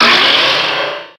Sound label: pokemon cry